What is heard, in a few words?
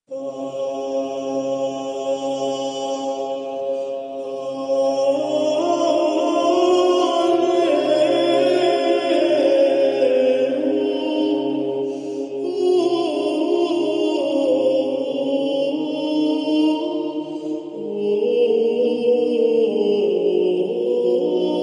organum duplum; leoninus